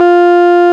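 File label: RMI piano F 3, EP; Sonic Reality - Vintage Timetraveler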